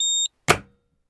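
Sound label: instant closing of doors with a signal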